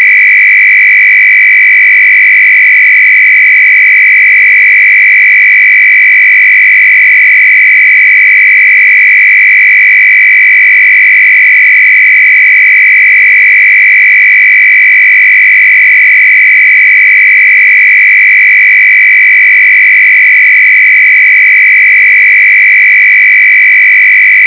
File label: mode FSK